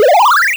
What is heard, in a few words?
status henka powerup; panicpumpkin